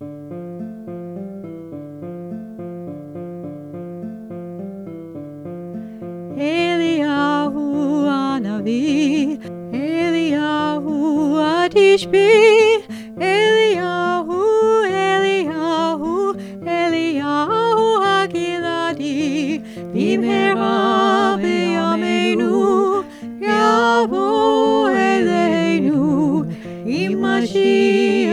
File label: guitar and voice